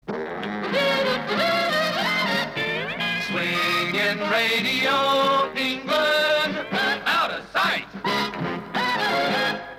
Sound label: jingle - out of sight